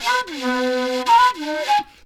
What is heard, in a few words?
FLU + VOC, FLUTE + VOCAL